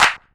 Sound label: CLAPS HIP, CLP; 90 s Sample CDs; Best Service Dance Mega Drums